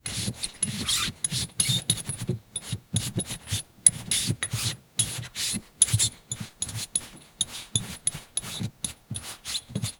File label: gentle clinking of glass